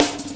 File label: Heavy Live B, Drum Loops, Jungle; Zero-G Jungle Warfare 1, 2 & 3